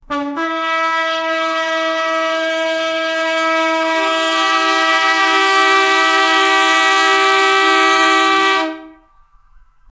NHL sounding goal, hockey

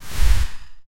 match 3 action soft mecha, steam release

